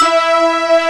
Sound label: MALE CHOIR